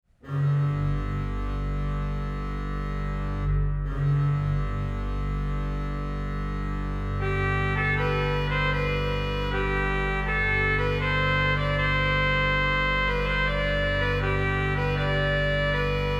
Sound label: scottish pipes, organist